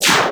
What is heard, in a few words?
Laser Soft